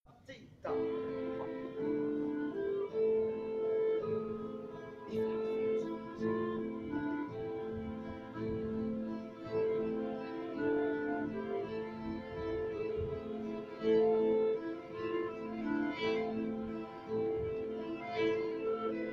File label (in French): Plan violons; Valse; La cancon